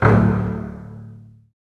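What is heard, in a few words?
Bass; E-Mu Proteus Pack; Digital Sound Factory Virtuoso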